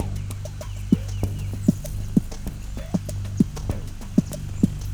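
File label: before Free spin Loop; Stikky; netent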